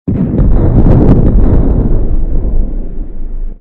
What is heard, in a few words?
Explosion